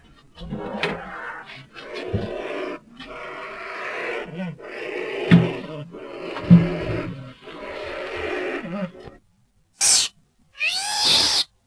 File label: Growling and fear cries, loris